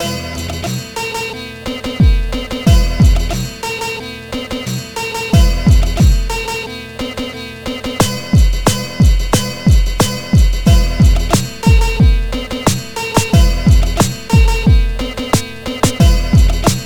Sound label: square stompers